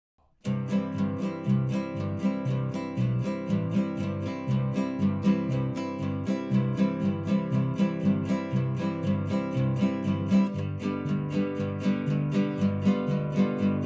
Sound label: Instrumental; Darths And Droids